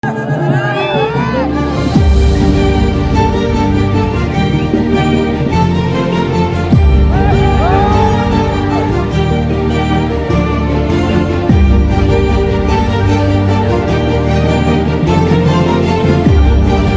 village festival danger fire crossing bgm tamil love music